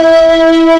Dirty Strings; instrumente

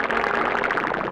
Mid Bubbles

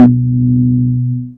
Wood

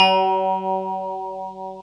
E-Piano; instrumente

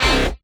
dance chord

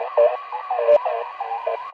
Radio II

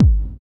bd room; percussion

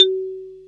Marimba

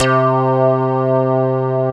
Digi lead; instrumente

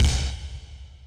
Distorted BD & Rev

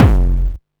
disto bd; percussion

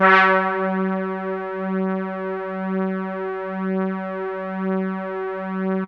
ocean

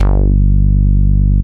Rubber, baesse

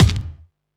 kick

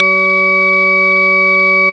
Organ

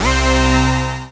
dance chord; instrumente

